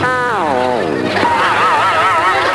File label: sboing; voxrom; boryslaw